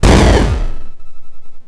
grind, EMU XL-7

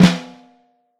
FLAM, Vintage Snare Drum; 7X14; Q Up Arts; Johnny.C's Vintage Snare Drum

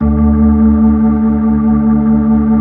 B-3, Full Xfade

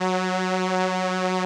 SOLINA, String Machines

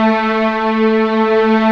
STR, Orchestral Strings

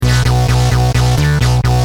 Driven, Bass; Industrial

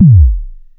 TOM KIT F#3, TOM SYNTH; USB Soundscan vol.20 - Fresh Disco House I

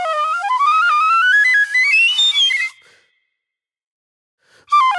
Flute

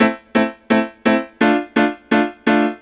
Piano

